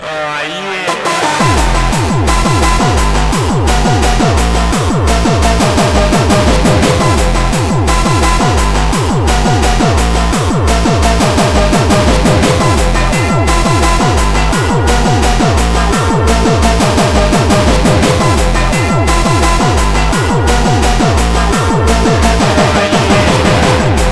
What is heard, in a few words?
arcade